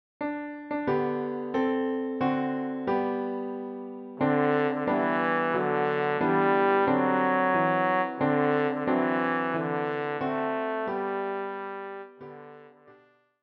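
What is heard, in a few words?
trombone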